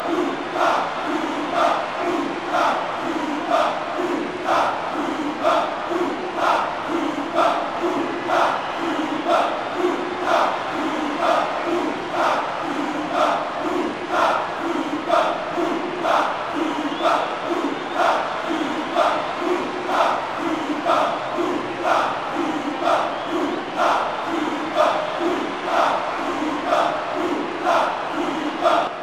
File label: Crowd Song; Bowser's Crowd Song (JP); mario strikers charged gamerip